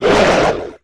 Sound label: Death